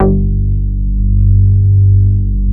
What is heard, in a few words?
MOOG BASS G2; Best Service Dream Experience